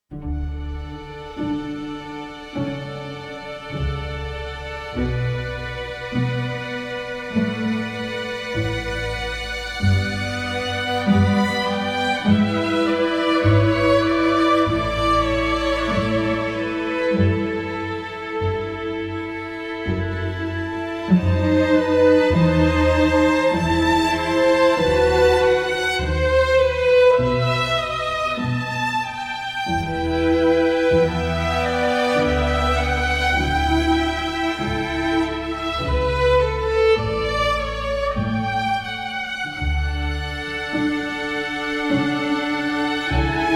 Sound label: Orchestral Suite No. 3 in D Major; BWV 1068 II. Air